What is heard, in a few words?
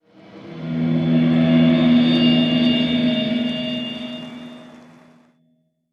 logo; Slinki; ZONE logo